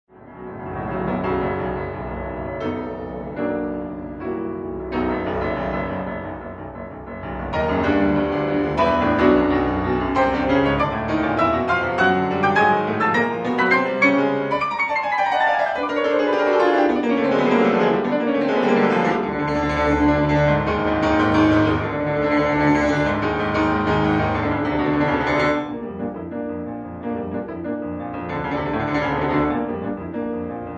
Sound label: toccata; tauriello